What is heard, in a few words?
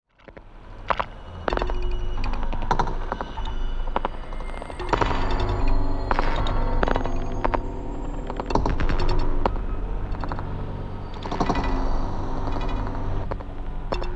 Slow Fire